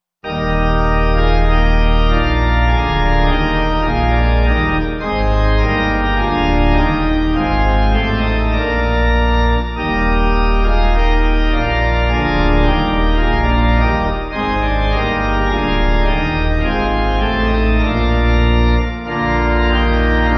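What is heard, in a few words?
Pipe Organ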